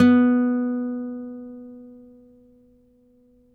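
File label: nylon, FLAMENCO, Guitars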